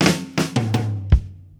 Fills, Monster Beats; Zero-G

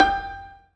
ZIMBAL G 4, ZIMBALON; Best Service; World Colours

